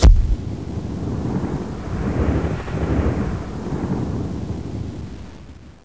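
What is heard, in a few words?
shockwave; Total Chaos Nukem 3d Pack; duke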